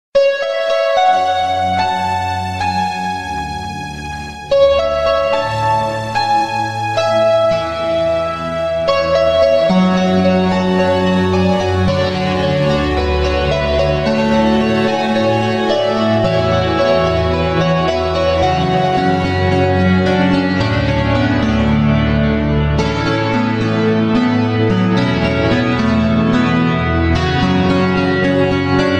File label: instrumental; rock